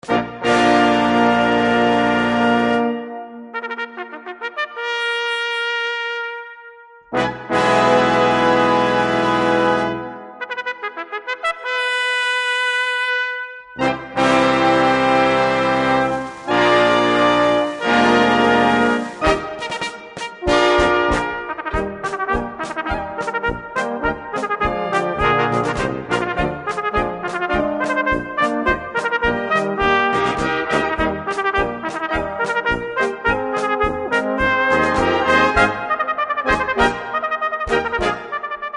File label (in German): Trompetenklang; aus Boehmen